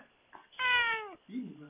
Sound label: cat meow